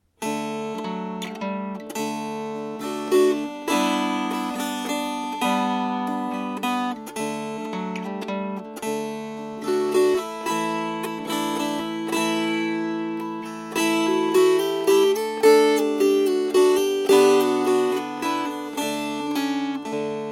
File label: Strummed